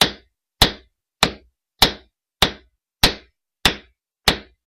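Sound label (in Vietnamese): Tiếng búa đập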